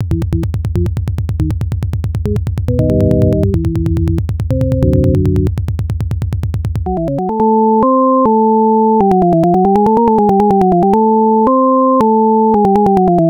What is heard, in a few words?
another random hit beat